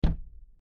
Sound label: car door open